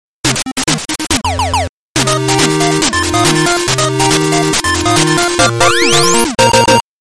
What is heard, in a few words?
8Bit Samba, Jingle